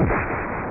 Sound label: EXPL